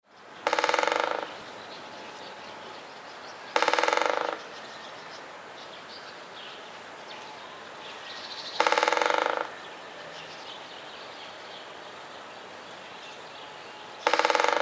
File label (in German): Buntspecht Trommeln; artenkabinett